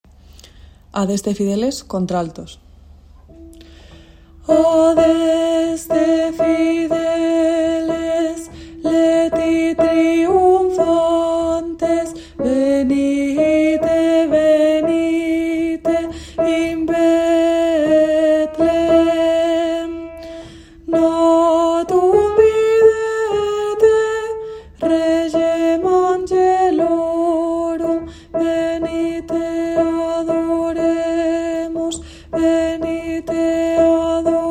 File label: adeste alt